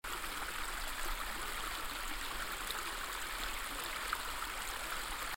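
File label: river mouth, water flowing in river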